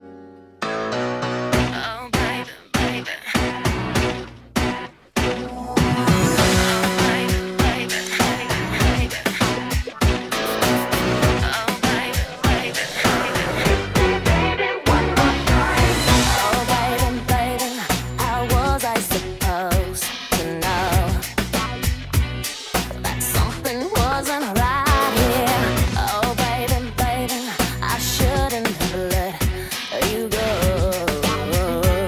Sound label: Jazz; Britany